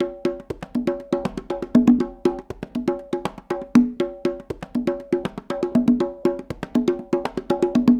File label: Congas, 70s Analog Funk; Sonic Foundry (Sony Creative Software); What it Is! 70s Analog Funk